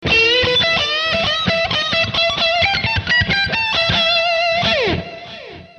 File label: E 115 solo